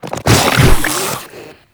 Strike Flesh; Enemies; Abilities; Warframe; Proto Infested Ancient

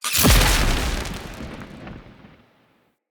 Shock Mote Strike

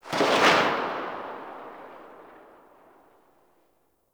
Pistol Tail; weapons; Warframe; Grineer; Orokin

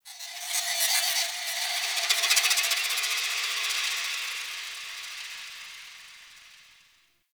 High Texture, Ambience; Warframe; The New War; Zariman

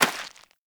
Rodent Hop Step Rear Dirt, Footsteps; Forest Rodent; Foley; Warframe; Characters

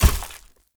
Step Vegetation, Footsteps; Enemy; Characters; Mendicant; Mnd King

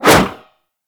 Golden Band Staff Whoosh; Warframe; Monkey King

